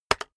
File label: keyboard external enter hit very fast